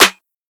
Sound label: snare clippy; one shots; SEISMIC Drum Sample Pack; Slate